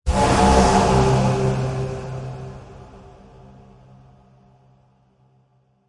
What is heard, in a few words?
Impacts Horn; Gothic Storm Music; Advanced Sound Design 2012